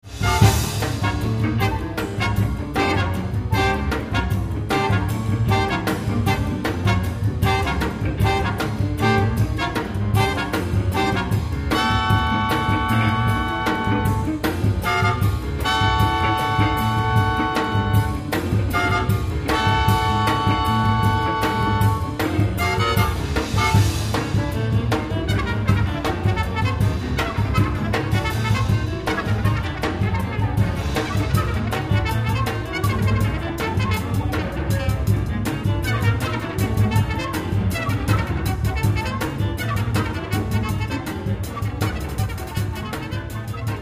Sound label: Funky